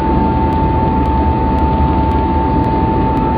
Motor, Train; OpenBVE

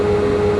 motor, Train; OpenBVE

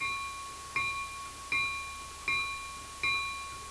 klaxon, Train

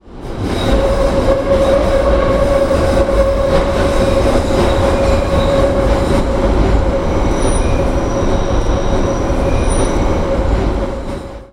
curve, Central Line; OpenBVE

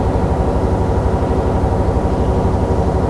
Motor, Train; GP38

